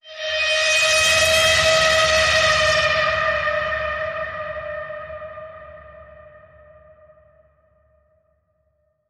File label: Bend, D sharp